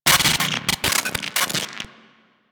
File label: Choke Transference Glitch; Operator; Warframe; Sacrifice; Sacrifice Arsenal